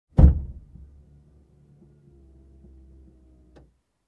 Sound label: Closing Trunk